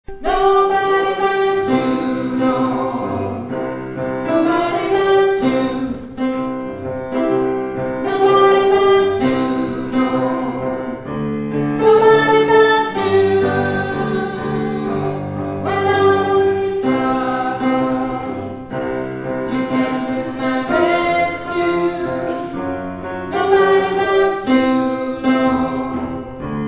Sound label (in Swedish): bas, stammor